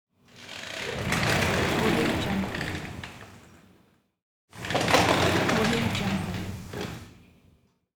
sliding door on wheels

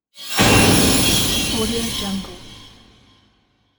horror metal jump scare; sfx laboratory

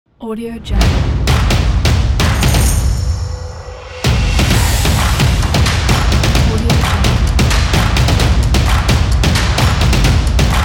stomp percussive logo

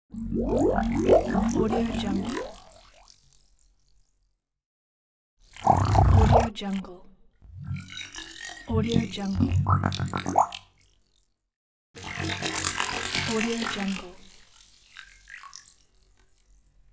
futuristic water elements

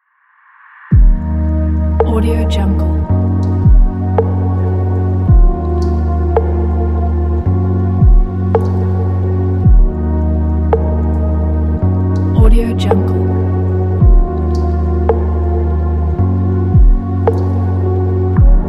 meditative ambient

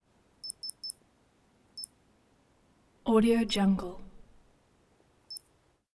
digital thermometer tiny thin beep

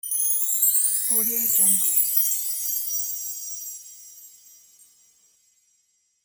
wind chimes from left to right

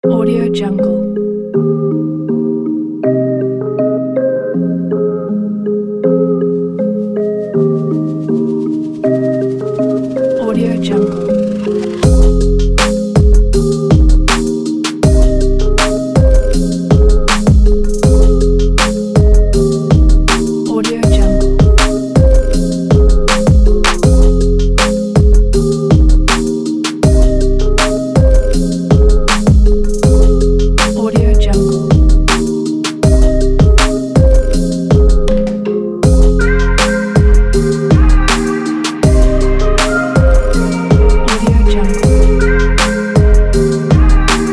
emotional melodic hiphop

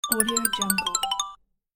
notice

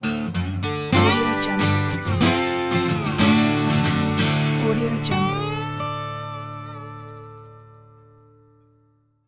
cowboy ident

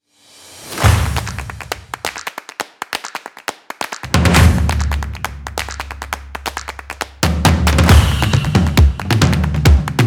drumming jungle drums music kit

